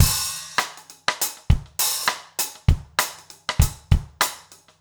basic funk 100 rims

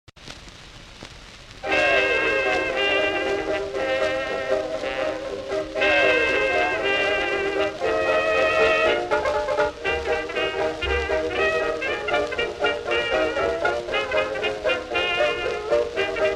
Orchestra; Popular Jazz Archive 1920-1936

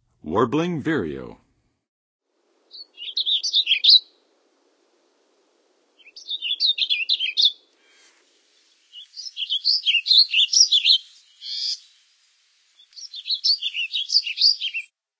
Warbling Vireo, Songs of Birds of North America; Western USA; Western USA Songbirds

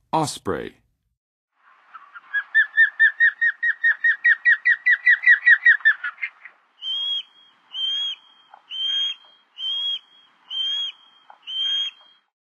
Osprey, Birds of North America; Western USA; Western USA Songbirds

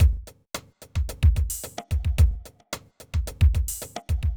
r n b kit 110

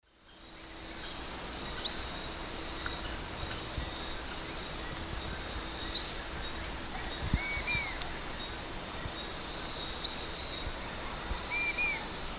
Iodopleura isabellae, calls pair; S. America; Eastern Lowlands

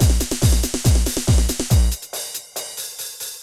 techno 140

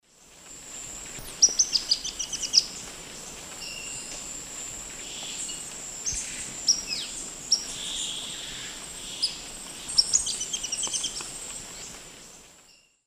Terenura spodioptila, calls and subsong; S. America; Eastern Lowlands

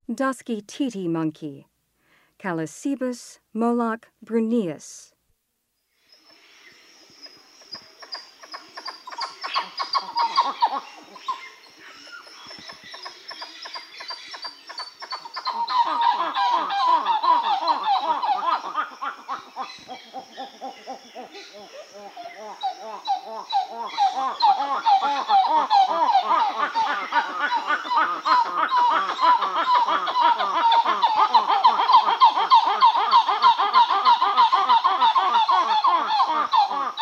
Dusky titi monkey; S. America; Rainforest

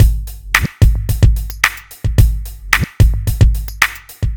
r n b kit 110

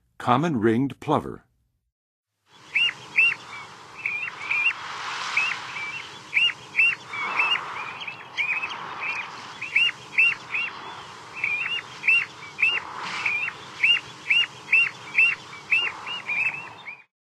Common Ringed-plover, Birds of North America; Western USA; Western USA Songbirds

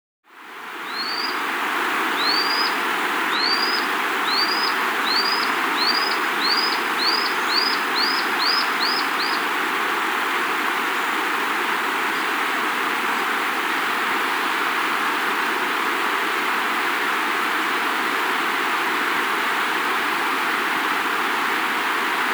Lanceolated Monklet (Micromonacha La; S. America

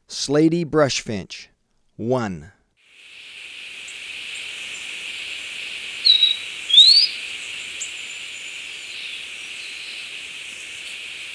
Slaty Brush-Finch; S. America

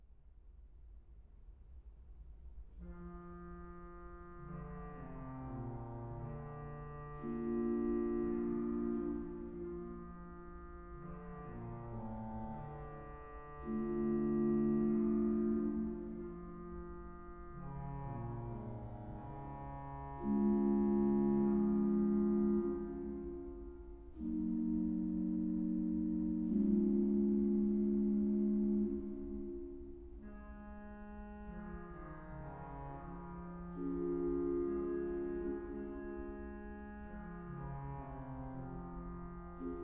Missa pro organo Kyrie; Franz Liszt; Complete organ works

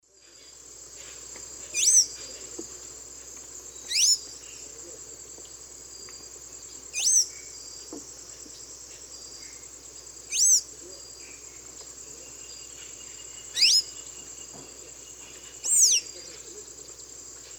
Thraupis episcopus, calls and song; S. America; Eastern Lowlands

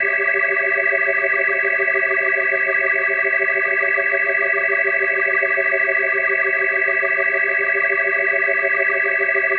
Organ A7+8va, Hip-Hop, Organ Licks

